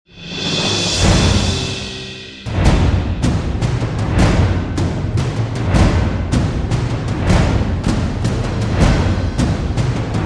Percussion; Abbey Road

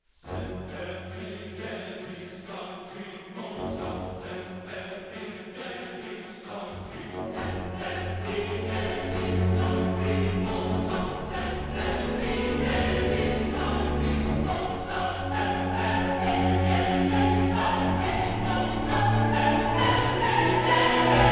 The Summoning Of Heroes, Orchestra And Choir Only; трейлерная музыка

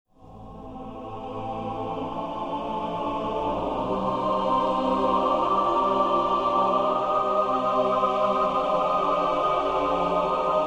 Choir; Abbey Road

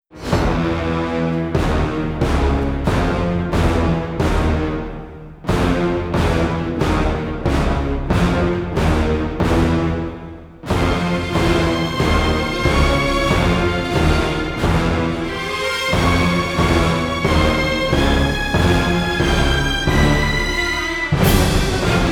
Orchestra; трейлерная музыка

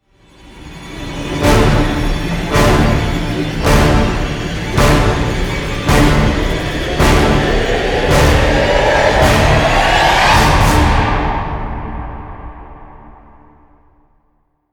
End Only, Orchestra and Choir

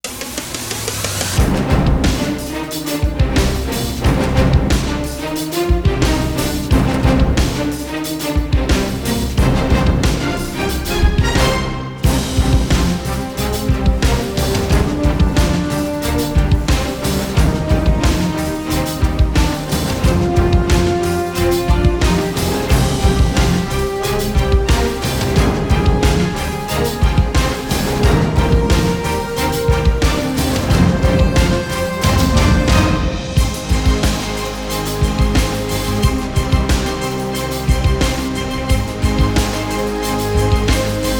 (No Choir), Orchestra

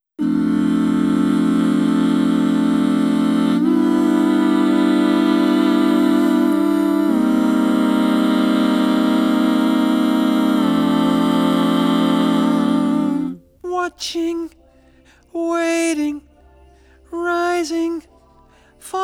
Vocal Harmonies

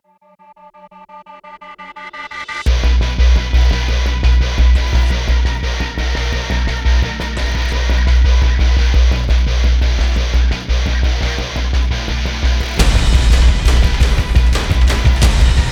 No Choir; трейлерная музыка

